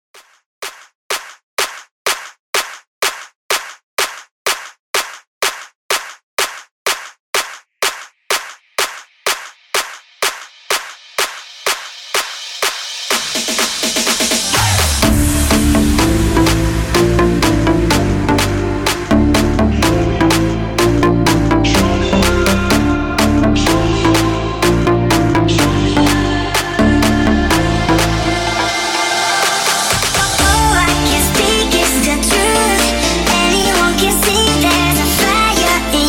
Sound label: clap starter, 125 bpm